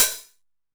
909 OHH, Tape 909, HH; Goldbaby Tape808 & Tape909

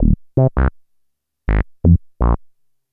Bass, Futurist Drum & Bass

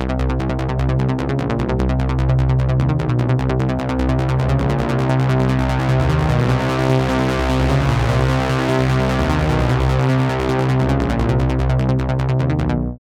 FILTER XPAND, BASSLINES 150; Masterbits - Tekkno Trax

